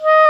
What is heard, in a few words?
WND CLAR, Clarinet Sh